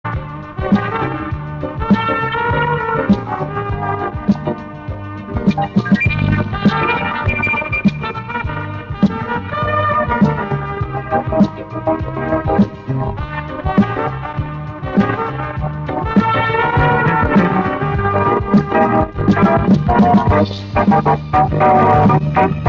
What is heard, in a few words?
instrumental